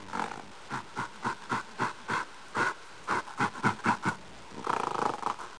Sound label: gorilla